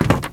old wood step; Golden Crayon Sounds

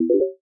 Triple Click mono; VoiceOver Sounds

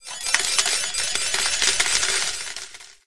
jackpot, Slot Machines; RS Games Sounds

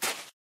sand step; Golden Crayon Sounds

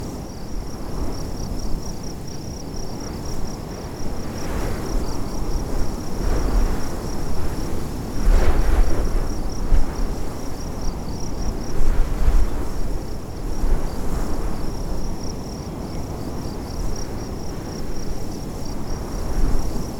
wind and crickets; Golden Crayon Sounds

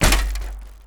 bike on; Golden Crayon Sounds

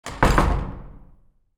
door close; Golden Crayon Sounds

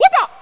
Braille Chord Clock Tick; ESAN VO Sound Schemes; ESAN MK Sound Packs

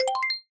Screen Change; quinton's new sound scheme

